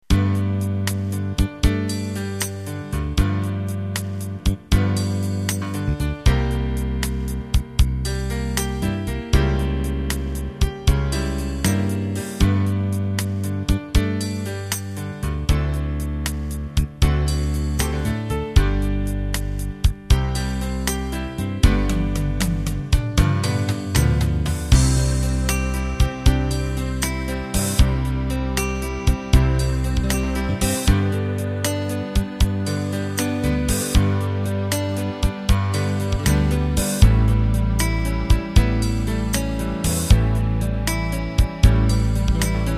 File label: Slow Rock